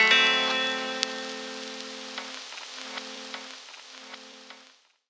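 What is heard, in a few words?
guitar lost; Rawcutz; Nu Forms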